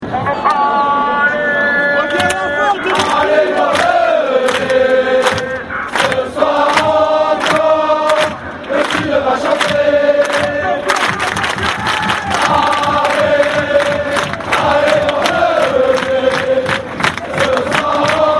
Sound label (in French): Le Sud Va Chanter, Chants